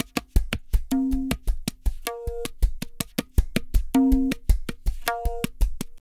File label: Pure Tabla, 080BPM; Zero-G